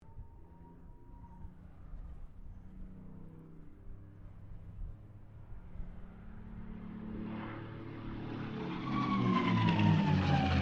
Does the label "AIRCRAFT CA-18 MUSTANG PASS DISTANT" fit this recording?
Yes